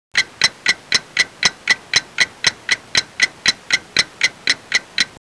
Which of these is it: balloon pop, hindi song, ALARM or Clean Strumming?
ALARM